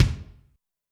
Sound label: kick, Zoom MRT-3B